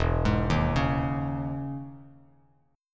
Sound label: E Major 13th down Bass Standard; EM13 arpeggiated; JGuitar